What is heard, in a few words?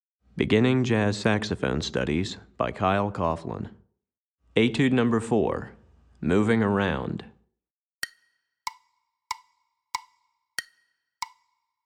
Piano Part, Beginning Jazz Saxophone Studies Alto